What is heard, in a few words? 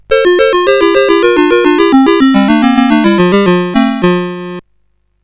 Quari, CSG8580R5